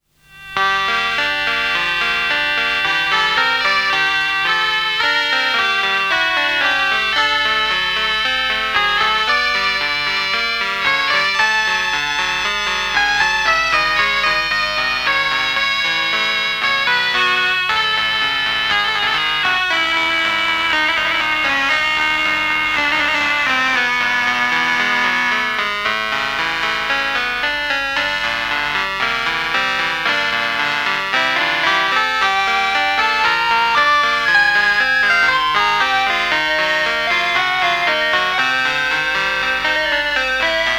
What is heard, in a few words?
Instrumental keyboards